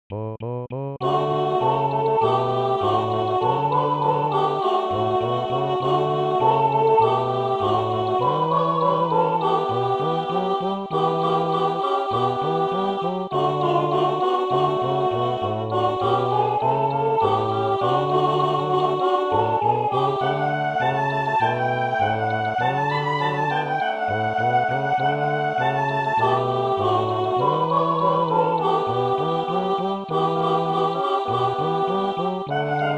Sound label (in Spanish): 32 la banda S voz, con voz; Coro "Con dos Bemoles"